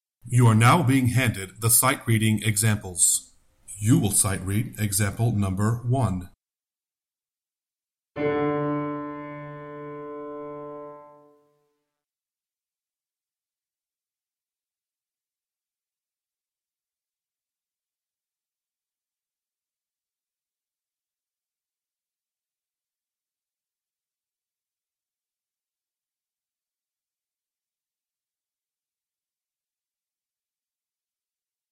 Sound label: Sightreading Example Bass